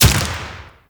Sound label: Deathshot Default, Bullet Impacts; Warframe; Weapons